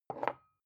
glass put down tipping slow

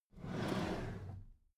packed drawer smooth clatter slide close

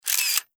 35mm camera shot

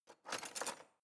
knife wood drawer handle rattle

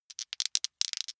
big two rattle in hand short

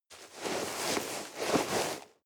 nylon leather medium

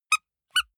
squeaker squeeze with release soft slow

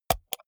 computer mouse wheel click hard

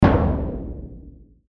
drums low

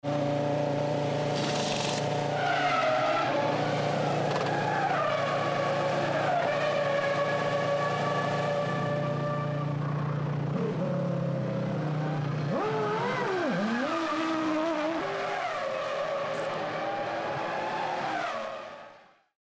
f1 race pit stop

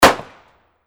44 caliber handgun

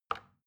plastic button tiny release regular

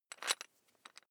electric drill bit fasten wrench turn very fast

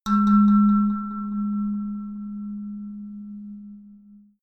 melodic odd tine bell low

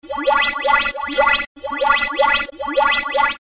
finished lazer

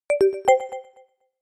notification short bell melody

